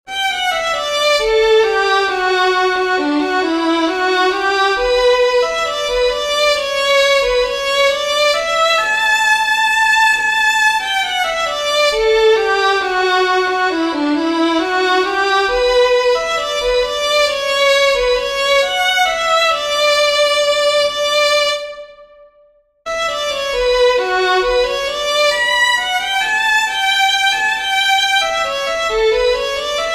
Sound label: VALSE, fiddlers; VALSE GISELE